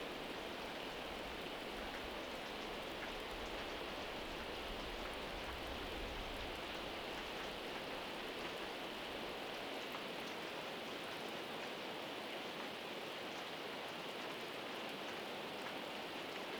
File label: Tranquil Rain; Bali